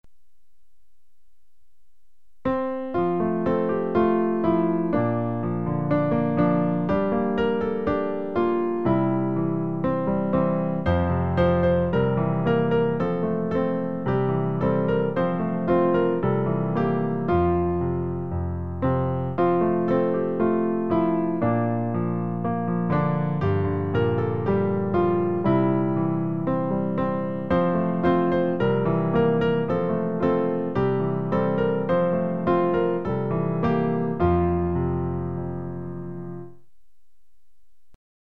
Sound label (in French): piano